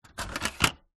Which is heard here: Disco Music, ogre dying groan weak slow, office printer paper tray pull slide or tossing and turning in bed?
office printer paper tray pull slide